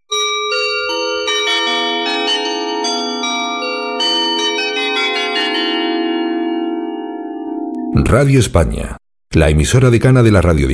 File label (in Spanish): jingle indicativo